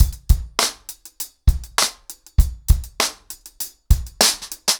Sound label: 100BPM, ACOUSTIC DRUMS; The Roots Drumloops